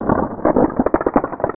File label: gohma birth, enemies; zelda